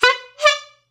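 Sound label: Bicycle Horn; SoundsCrate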